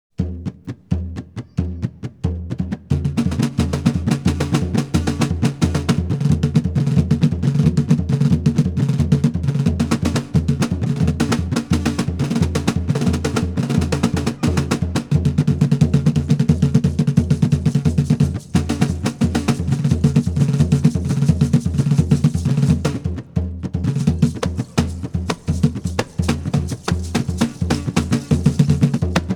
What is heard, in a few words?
wanaragua (masked dance drama)